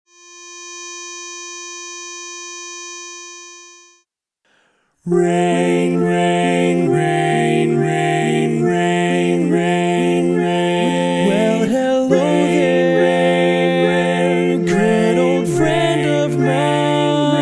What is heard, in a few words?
pitch